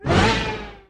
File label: level up; Clicker Heroes